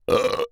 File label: burp; OPENAIR; Anechoic